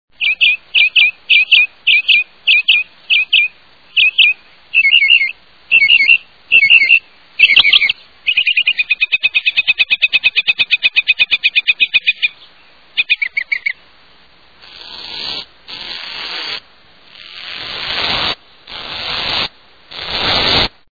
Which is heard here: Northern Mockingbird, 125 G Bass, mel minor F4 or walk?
Northern Mockingbird